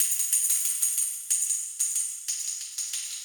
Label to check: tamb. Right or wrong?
Right